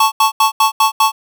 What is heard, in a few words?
alarm urgent